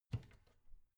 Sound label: bathroom cabinet door creak open heavy